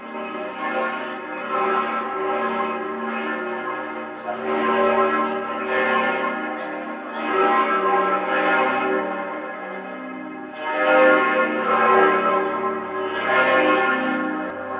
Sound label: Cathedral Bells